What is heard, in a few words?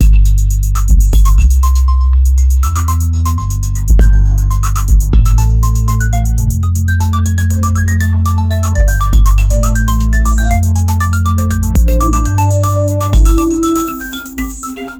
retro 8 bit arcade music, bouncy